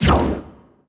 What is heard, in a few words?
ocularrift shoot